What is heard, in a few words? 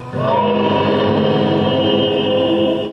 howl